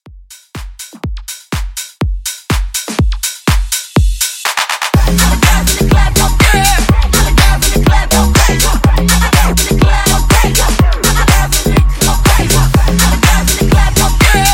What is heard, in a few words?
House Mixshow Edit 123